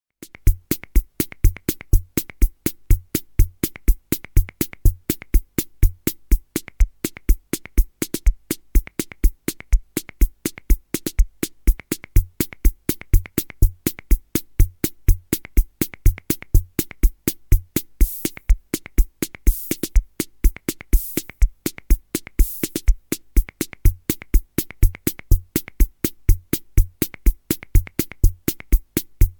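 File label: rhythm80 var pasodoble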